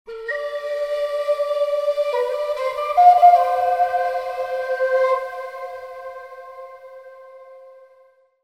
Flute Ambience Warm; RaySpace

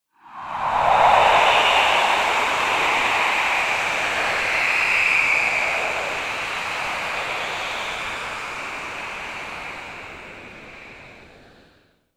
Applause X 50000; Concert applause; Crowd Chamber

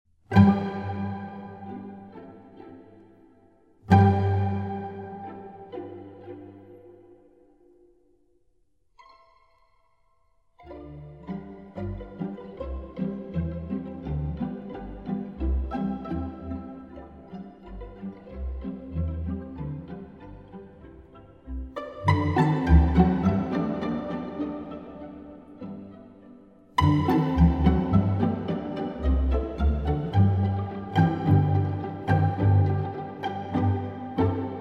Pizzicate-polka (Church); Strauss

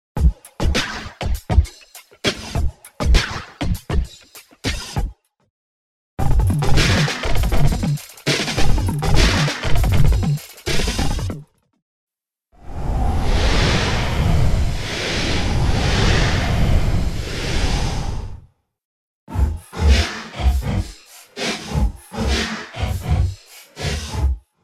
Drum Loop Warping wet; Crowd Chamber; Drum loop warping FX (four)

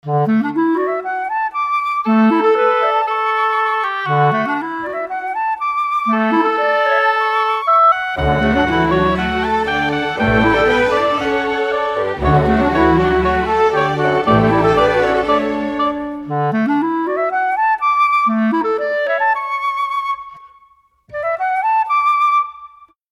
Concert Orchestra Large DRY; RaySpace

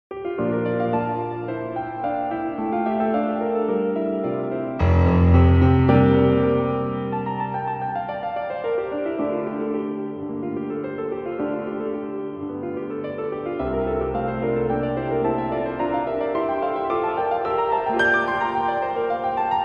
Piano Debussy Prelude Hall; RaySpace

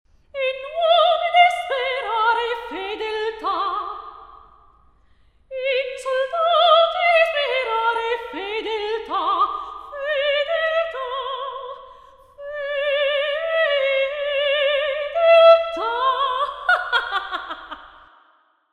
Vocal (Concert Hall); Mozart

